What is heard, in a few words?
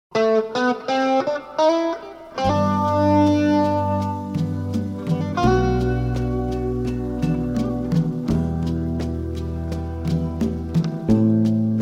leslie